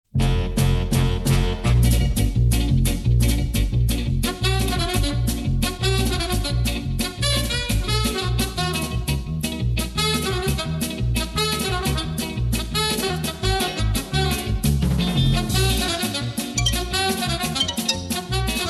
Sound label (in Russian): русская музыка